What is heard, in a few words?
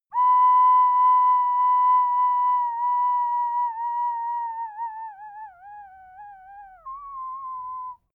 ocarina collapse